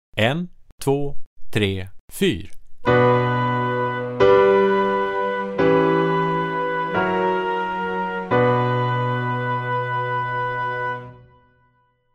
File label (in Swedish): Ackord; Övningsprov